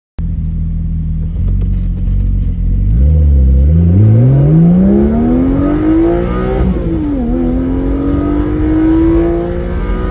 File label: comparison soul oap vc versus silenced valved exhaust w stock oaps, gt4; 718 gts 4 0 gt4 gt4rs spyder 25th anniversary; jcr silenced valved exhaust w stock oaps